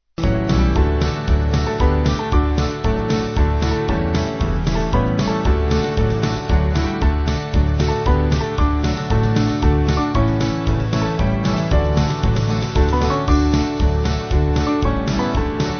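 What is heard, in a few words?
Band, Music Backing Files for Churches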